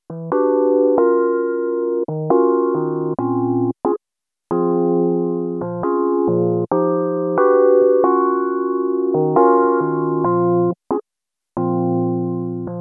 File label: Sexy EP, Electro Lounge; Sonic Foundry (Sony Creative Software)